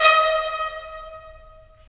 BRS, Tps Stacc; Roland - LA Composer vol. 4

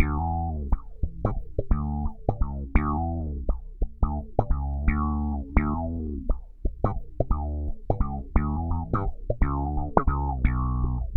FX slap 86, Bass Grooves; USB Soundscan vol.30; AKAI

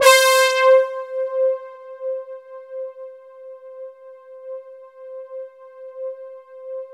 poly brass; JV-1080; Syntec; Wall of Sounds

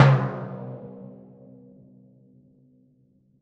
B2 f, Timpani, forte; PowerFX; Downtown Orchestra; scales

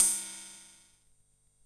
KR-55 CYMBAL; Polyend; Stazma & Concrete Collage; Concrete Collage Drum Kit; Concrete-55

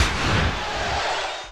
weird; Best Service - XXL Production Effects

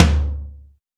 TOM, Drums; Best Service; Real Mega Drums VOL-1

